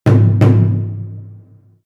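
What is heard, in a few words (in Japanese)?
taiko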